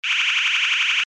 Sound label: alarm tone, sounder alarm tone; sounder beacon